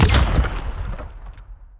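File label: wheel cannon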